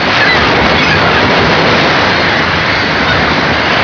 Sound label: ocean light; PC Gamer IT CD 41; Daikatana